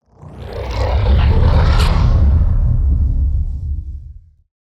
Just Whoosh; SoundBits

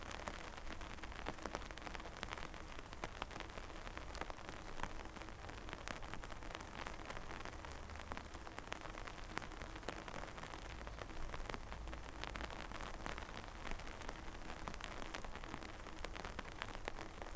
rain umbrella; 99Sounds; Rain And Thunder

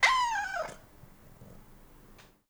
animals cat meow; mono; Soundholder; Cat - Meow Library

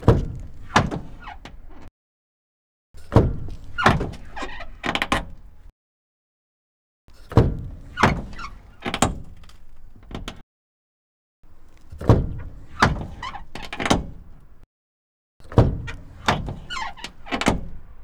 Renault Master 28dTi Foley Passenger Doors Open; Renault Master II; Mono

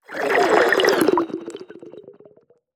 Whoosh Sweeteners Water-Swirled; SoundBits; Just Whoosh 4

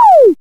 lose; Kenney's Sound Pack; Retro Sounds 1

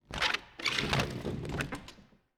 Wooden Plank Scrape; 99Sounds; The Warehouse

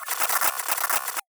Computer short; SoundBits

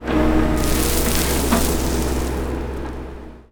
Bucket Pour Earth, Excavator Sounds; Mechanical Wave

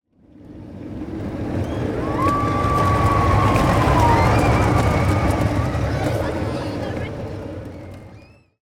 Pass-By Fun Ride; SoundBits; Pass-By Fun Rides

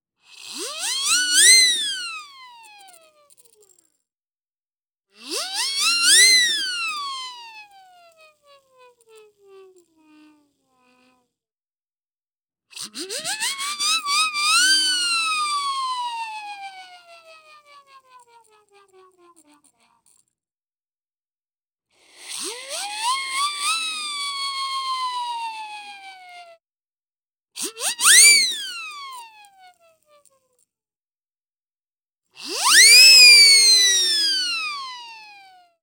Siren whistle plastic various; SkewSound; The Fool

